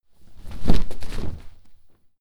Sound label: clothes hit drop mid